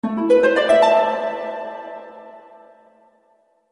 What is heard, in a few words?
Grand Harp Tone